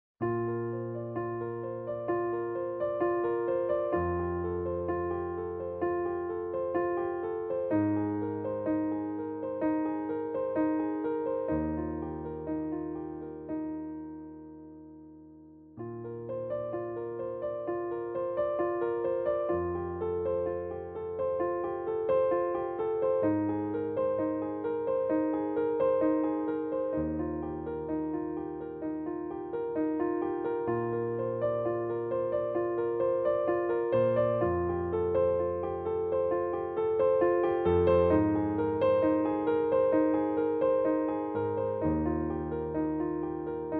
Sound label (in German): Playback B-Dur